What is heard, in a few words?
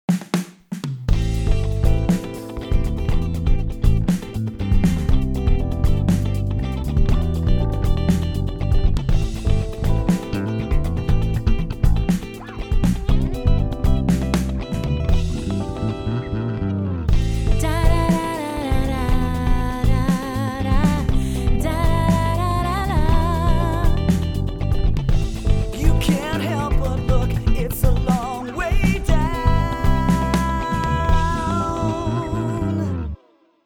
Portico 5043 Mix dry; Rupert Neve Designs